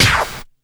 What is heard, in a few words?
smash scratch; BERZARRESOUNDZ